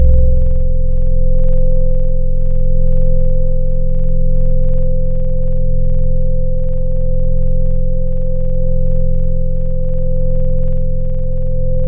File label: Keys Organ; Synthetic's Serum Collection; ESW X Keys